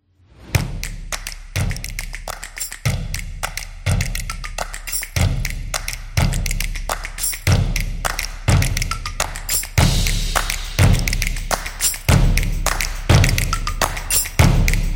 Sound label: Snap, energetic drumming